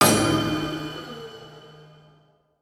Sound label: ghost impact, halloween; player